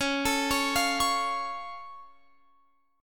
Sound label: Db Augmented, down, Guitar, Open F; arpeggiated; JGuitar